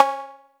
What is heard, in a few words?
Cowbell Electro